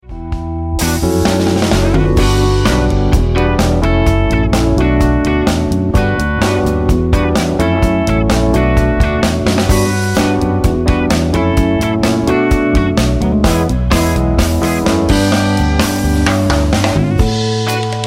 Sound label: 128BPM Bm